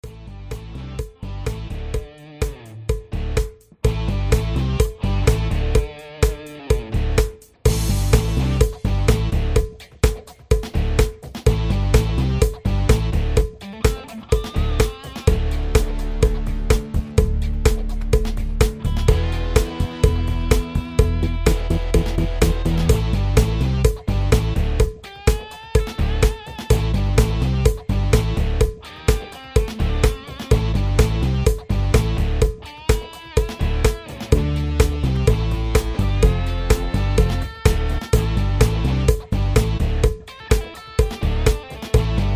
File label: Patter